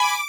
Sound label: violin